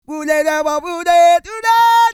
SING, World Voices, CARIBBEAN

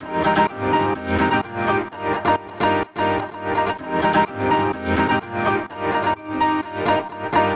127 A# FX; Salvation; MELO + FILTER; RoBKTA Sample Pack Supreme

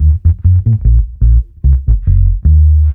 bass loop, Ragga & Dub Reggae; AKAI; USB Soundscan vol.27

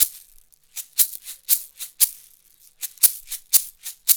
Roots Reggae; Sounds Good

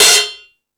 Rock N Roll, E-MU Pro-Cussion

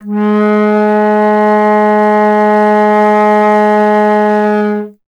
Alto Flute

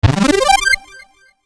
Arpeggio G, Techno Synth; Sonic Foundry (Sony Creative Software); Techno Synth Loops 1 Signals I

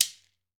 GHANA, African Perc; E-MU Formula 4000 Series Vol. 4; Earth Tones

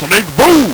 LSDj, Nintendo Gameboy; 300 Drum Machines

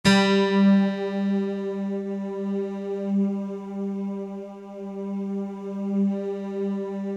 YAMAHA TX802 delay; OTHER SYNTHS; YAMAHA FM; Rubber Chicken Software Sample Bank

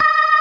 organ, all stops; Keith Emerson Signature Series

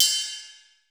RIDE CUP, drum; Crypton - DrumBase5000

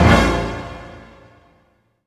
HIT, Orchestral Loops

